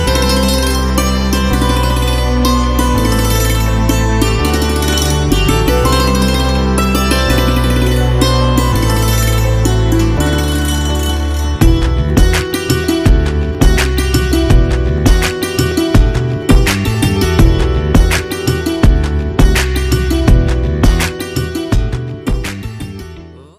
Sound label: Instrumental